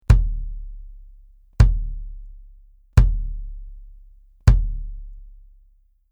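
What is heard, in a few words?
BASS Clr Hard Plastic, bass drum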